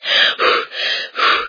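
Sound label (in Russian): дыхание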